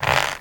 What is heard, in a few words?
Turn